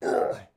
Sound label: death; jocly; tafl